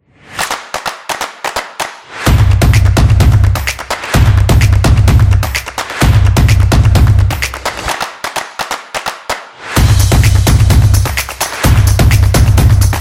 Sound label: Stomps Drums Claps; percussive nation vol 10